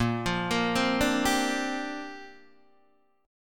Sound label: Bb 6th Add 9th Bb Guitar DADGAD